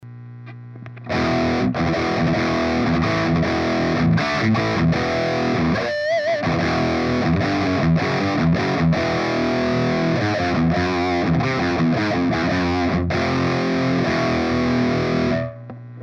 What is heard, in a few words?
XXL Cab B